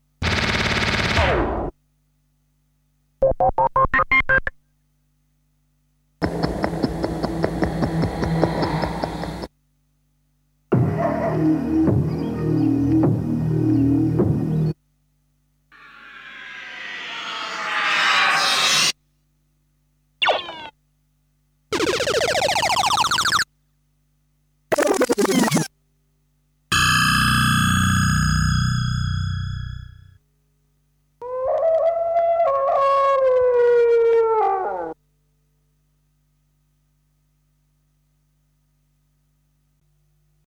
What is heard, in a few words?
Sound Effects; Kniteforce; 1000 Samples Volume 1